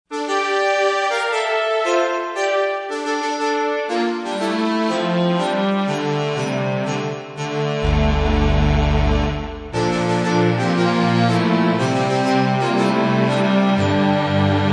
BrassChime, Yamaha TG55; Factory Presets

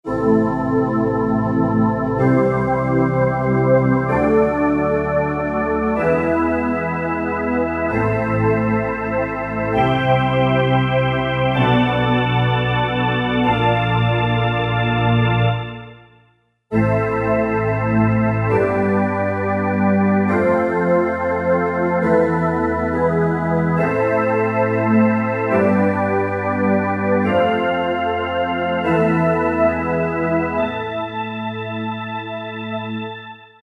D-50 Pad; Roland SC-880; Factory Patches

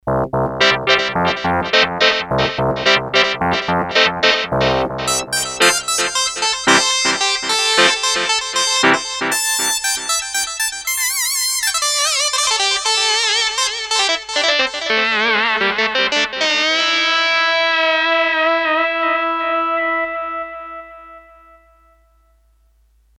Synth Lead, Korg DS-8; Factory Patches

